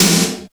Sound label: CYBER KIT, DRUMS